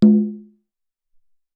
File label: Cong Lo